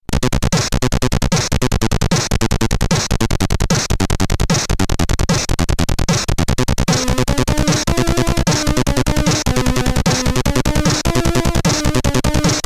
Stage 5 (SID Stereo), commodore 64; Enforcer

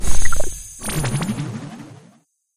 COMPLETE LEVEL; Dino Shift 2

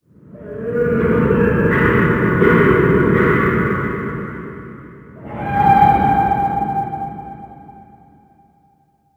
environment forest creature howling; gamerip

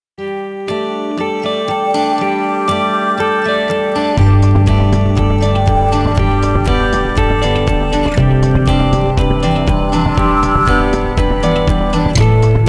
Intro Music; Daring Game for Girls; NDS

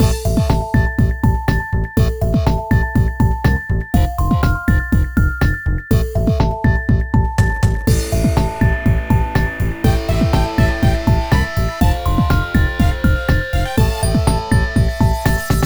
Music BOSS; Super Scribblenauts 2010 NDS; STAR BOSS

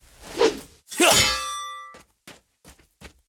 SFX 3D Sword Attack; Puzzle Chronicles

